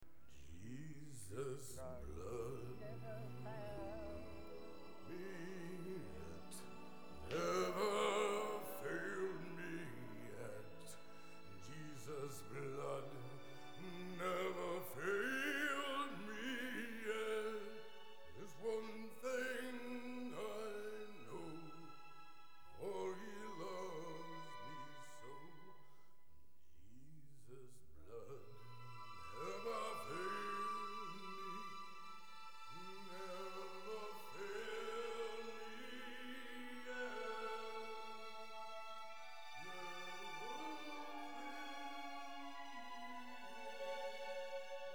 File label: High Strings